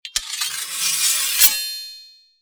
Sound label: draw the knife long